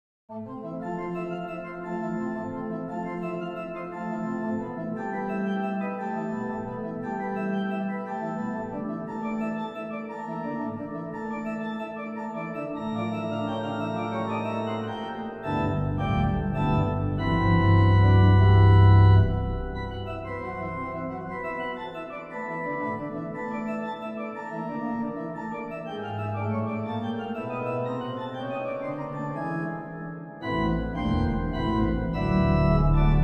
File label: Organ; Praamblen 6 Allegro